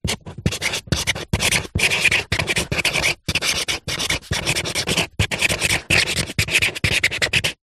office pen highlighter write on paper on cardboard